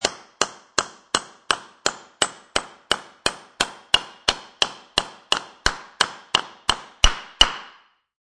Hammer Nail Multiple Hits, Industry, Hammer, Hammering Nail Into Wood, Multiple Hits